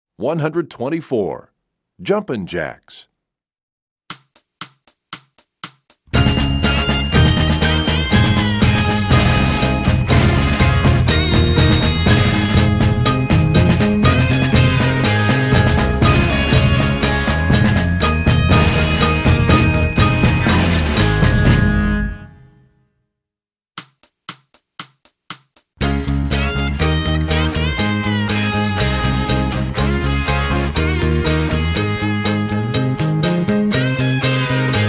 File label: Percussion; Jumpin Jacks; EE2000 Book1